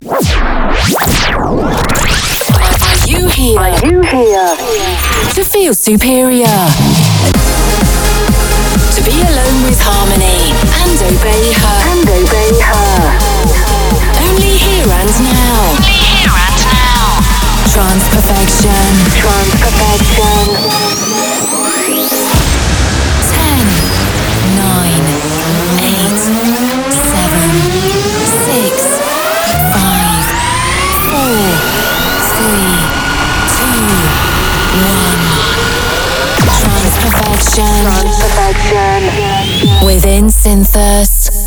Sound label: Trance